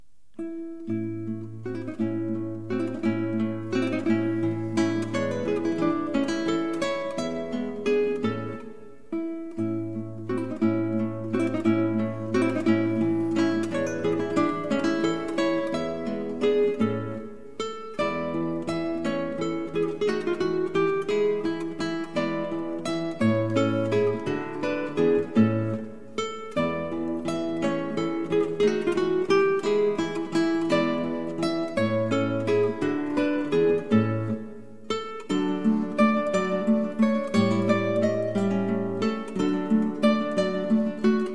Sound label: waltz, duo